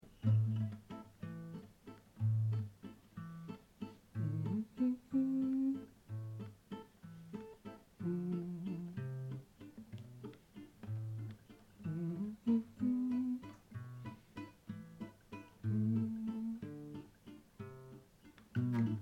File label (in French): Accords Mi Chant